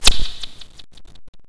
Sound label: whip